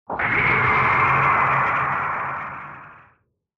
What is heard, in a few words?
Retro Anime Accent High Long